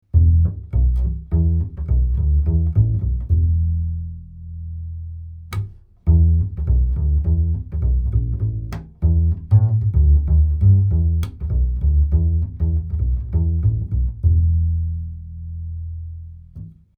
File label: Upright Bass 40cm F Hole; Oktava MK-117